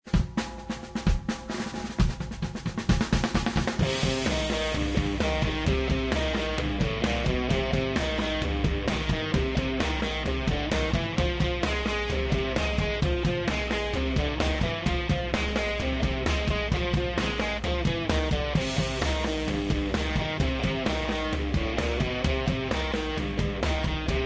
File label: music; medic; source engine; tf